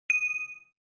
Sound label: incorrect